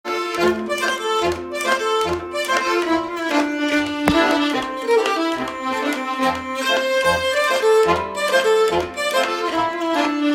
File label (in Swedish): Schottis; Svennings Schottis; Ingelsta